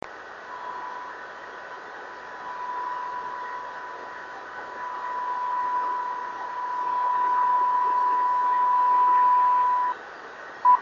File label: 26 MHz fishnet buoys / drift net beacons; 28030KHz